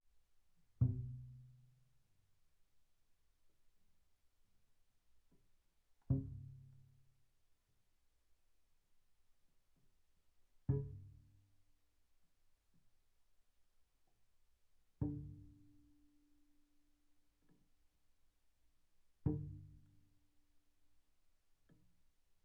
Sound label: Bass pizz sul E pp C3 E3, double bass